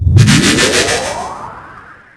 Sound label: orb pickup; wraith